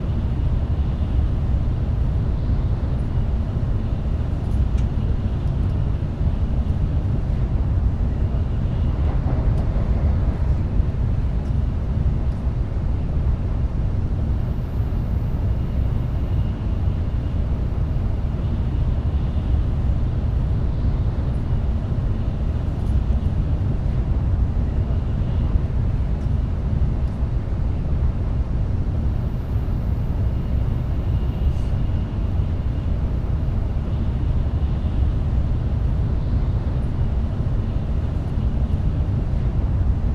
Shinkansen

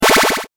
powerup

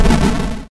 game explosion; arms

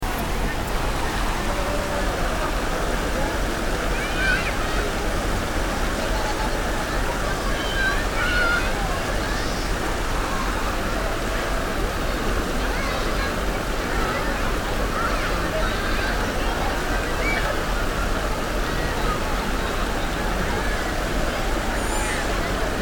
mountain children

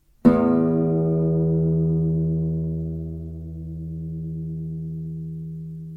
guitar

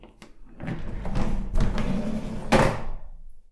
alum door

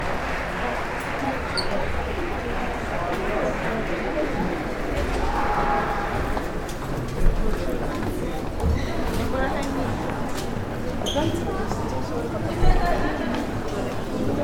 gym; sports

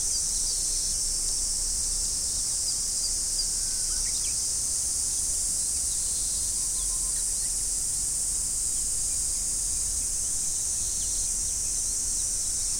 glassland

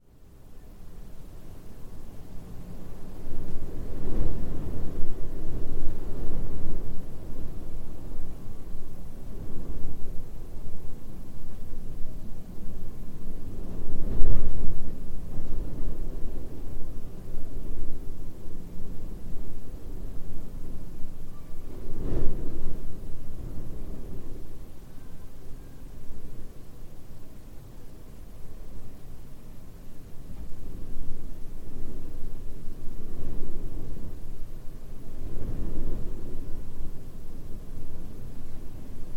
wind at night; nature

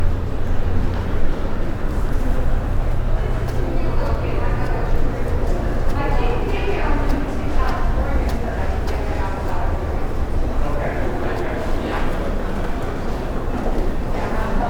walking st

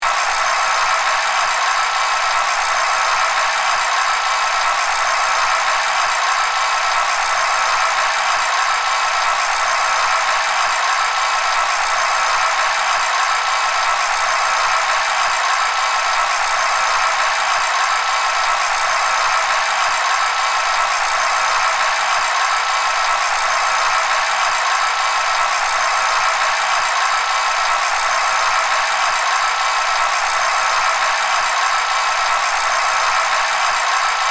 bicycle

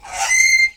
tropical bird; animals

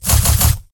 cut with sword

